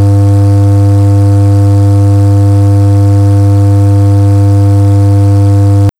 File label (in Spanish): 100 Hz activo